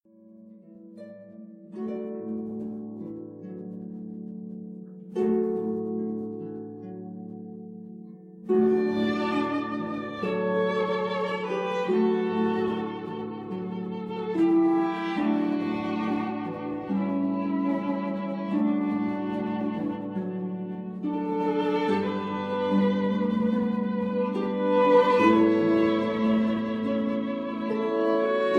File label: joy 2 violin